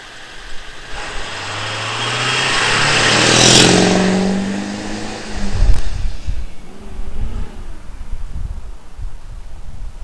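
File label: Duramax Drive by; F550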